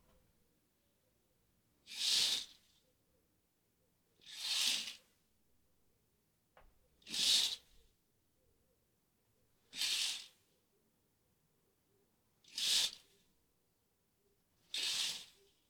Opening And Closing Curtains Fast

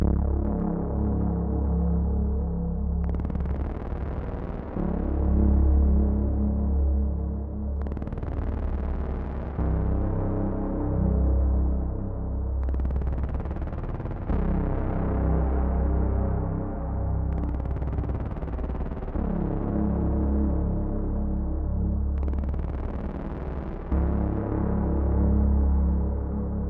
Suspense Synth

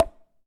Hit Hollow

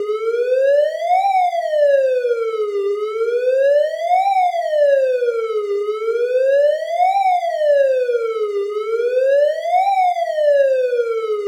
Ambulance Siren Loop

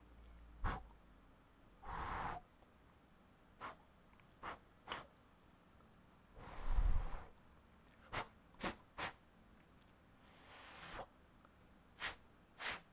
Blowing

